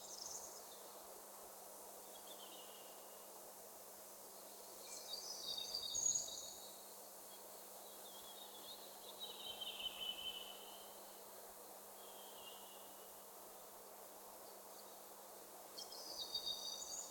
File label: forest ambience